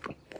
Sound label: Suck